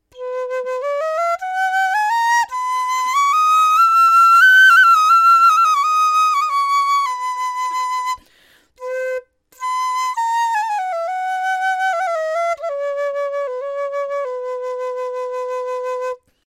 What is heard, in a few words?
dizi